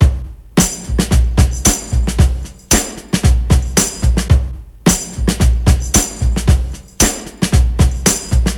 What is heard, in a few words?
112 bpm breakbeat sample c key